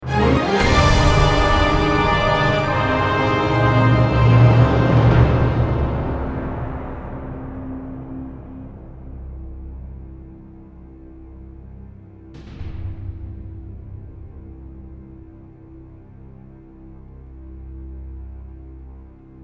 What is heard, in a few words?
Sting with low resolve; Super Millionaire Sting; Millionaire Soundtrack